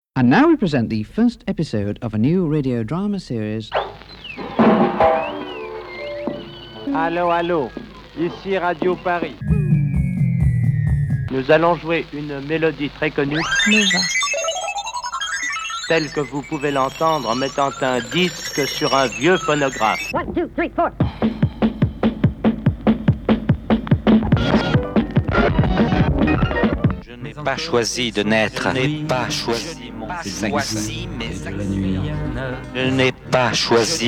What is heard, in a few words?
Radio Nova Jingle; Nova 25 ans - 1990